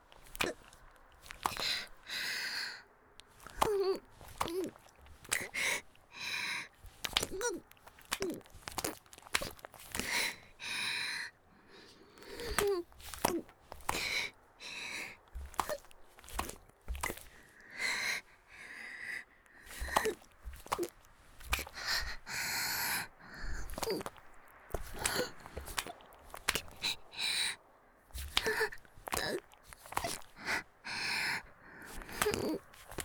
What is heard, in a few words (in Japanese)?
木枯らしバージョン ギシアンループ; 田舎の別の無感情少女と自己犠牲愛; おまけ (第4、5話のギシアンループバージョン)